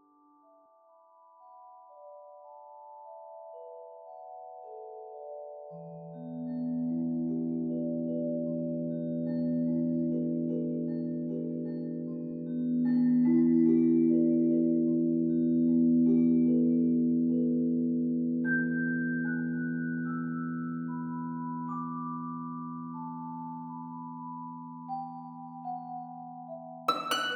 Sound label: Camp Harp D